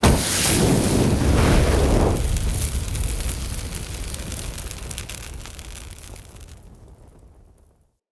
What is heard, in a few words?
Fire Hazard Object Ignite Sizzle C; Undercity